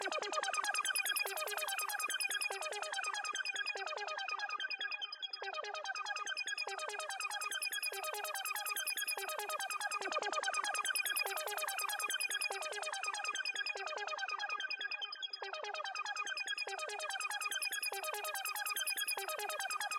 Synthwave Shimmer 96bpm; hot lava; ios macos windows

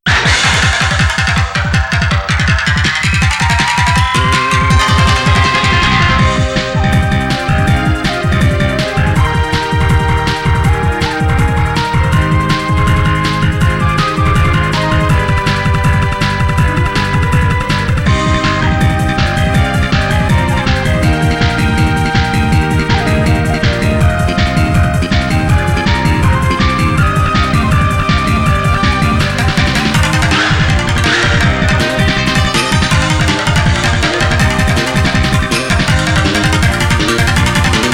Traditional Folk Song; Super Robot Wars Taisen Gaiden Masou Kishin The Lord of Elemental Sound Storm

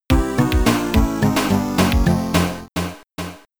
Snowy Peaks Jingle

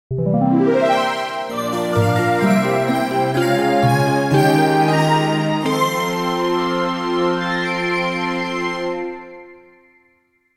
Jingle, Wii U Chat Connected

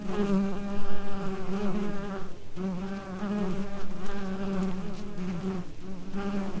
Flies; miside demo windows gamerip 2023

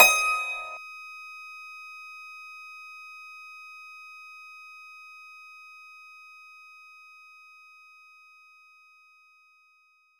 Dulcimer B5; knights try

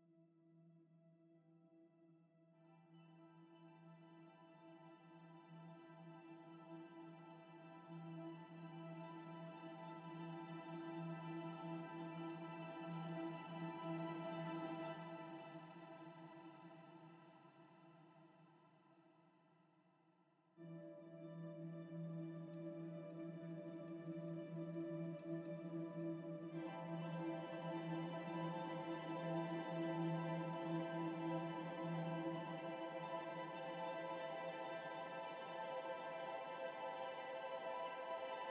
Police

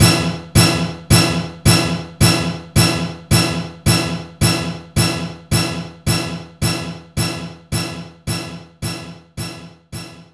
Orchestra Hit

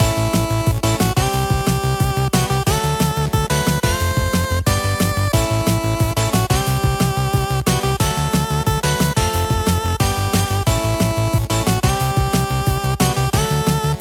Henk 8bit Main; action henk

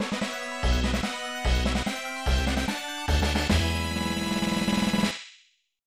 Great Victory!; bonkers; snes

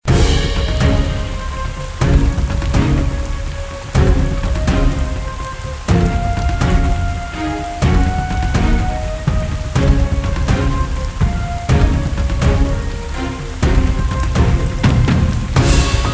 BGM map rain; herios

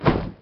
THROW; Mr. Bean

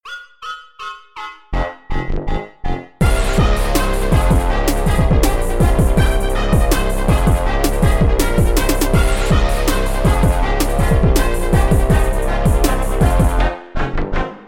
Brass; Hazard; Suits Absolute Jazz

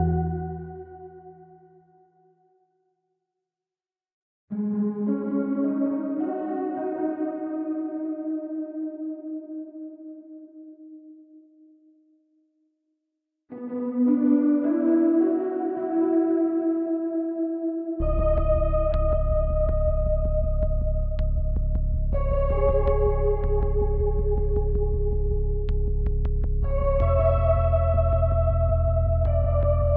Mix Stem Layer

